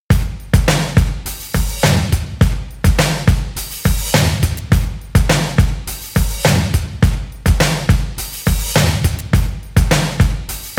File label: instrumental loop bpm 104